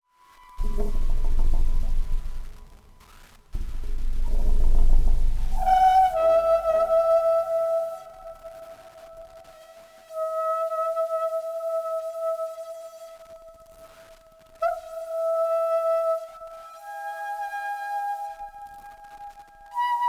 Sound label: Rohr Reed